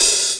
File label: DRUM UST OPH; SP-12+1200; Greytsounds VOL-1